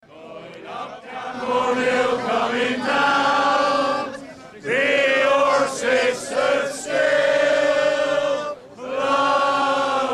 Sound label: cornish rugby supporters burst into song